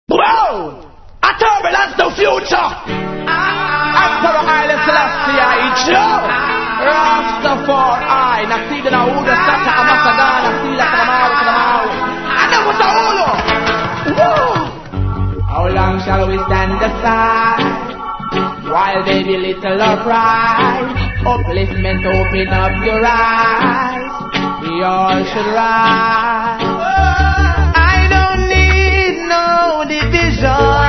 dub blues riddim

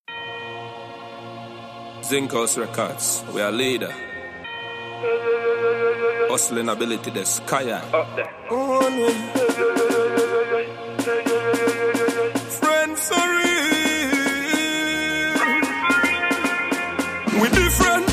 riddim

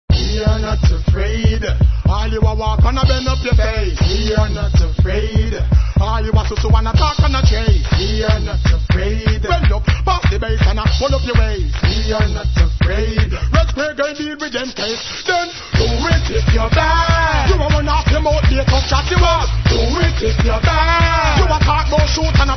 dancehall riddim

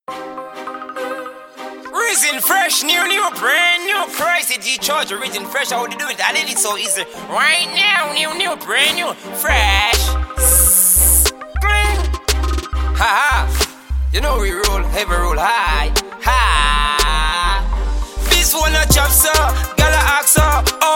Riddim

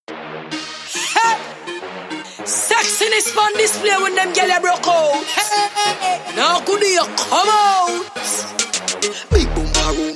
fire alarm riddim

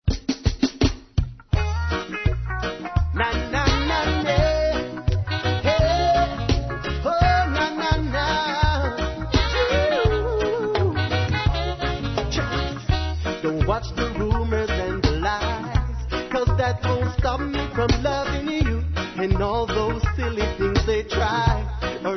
meditation riddim

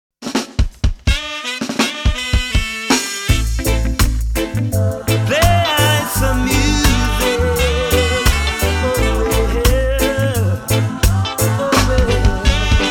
riddim

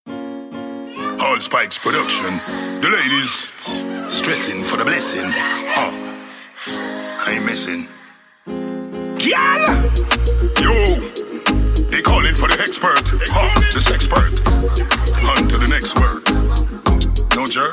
talking parrot riddim

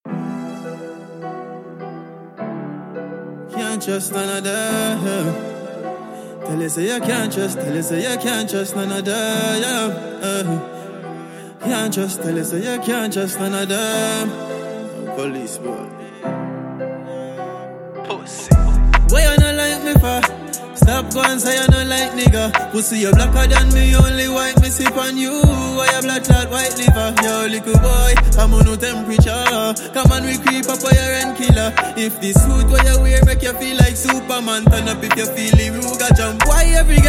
Riddim

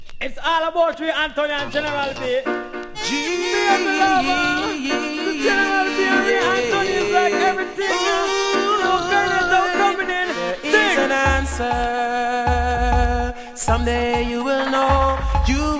riddim

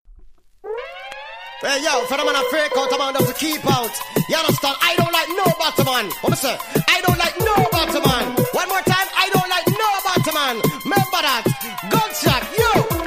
siren riddim

